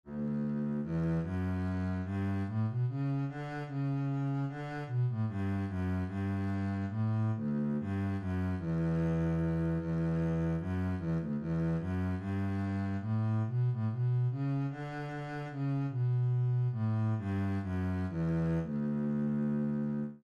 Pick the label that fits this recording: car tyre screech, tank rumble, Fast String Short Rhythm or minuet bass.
minuet bass